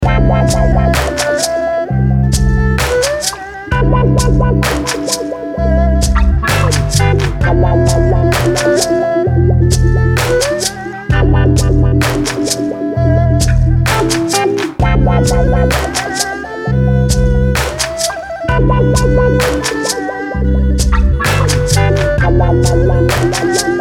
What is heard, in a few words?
Oriental Funk